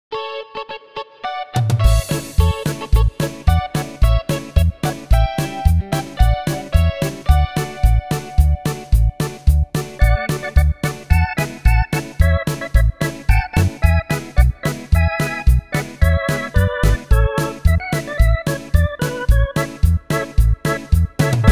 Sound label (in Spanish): Corrido